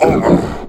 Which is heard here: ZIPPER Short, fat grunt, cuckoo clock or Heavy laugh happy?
fat grunt